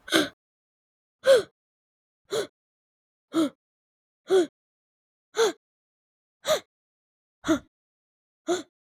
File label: Me Gasping